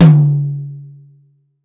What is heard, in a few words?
Y9 Tom; Akai MPC500